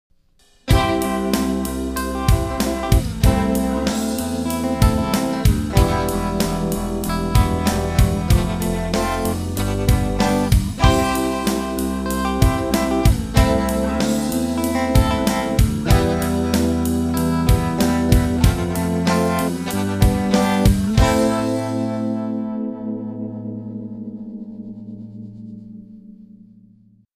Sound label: Wiggler Classic Vibrato